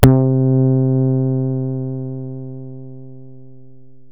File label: Synths BASS